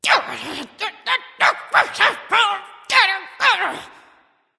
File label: grumble; smite; arachne